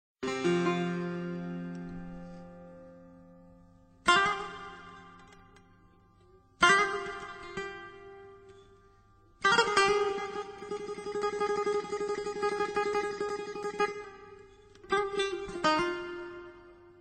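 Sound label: persidskaya muzika, Persian music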